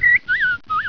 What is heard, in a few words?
BIRD